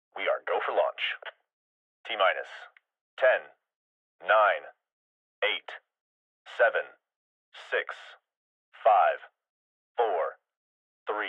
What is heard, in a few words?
launch sequence